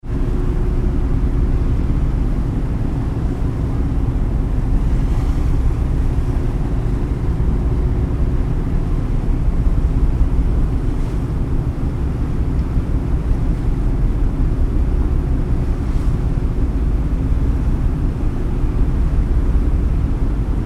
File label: Ship Large Ferry Deck Atmosphere under Sail